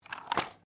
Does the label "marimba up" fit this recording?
No